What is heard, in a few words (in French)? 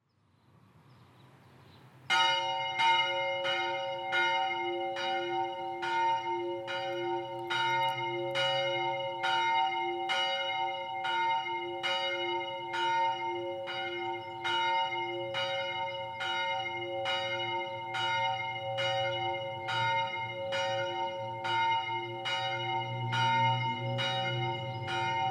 solos, sonneur de cloches; Siviriez